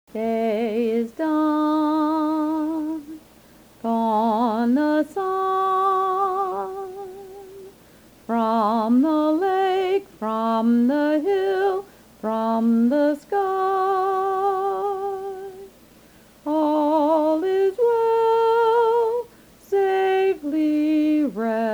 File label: Taps